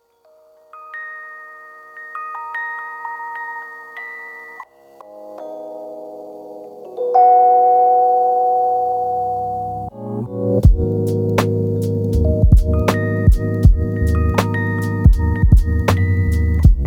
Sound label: lofi hip hop